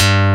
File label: SHARP BS, DANCE; Pro-Rec - Synthsonic; Z1 DANCE